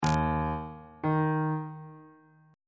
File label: f double flat note